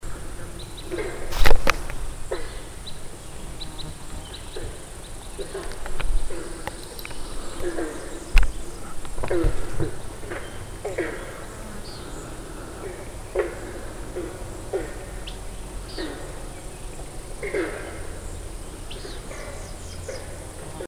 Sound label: Red Crossbill; 1000 Acre ME